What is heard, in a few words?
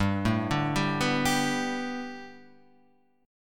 B Minor 7th Sharp 5th G, chord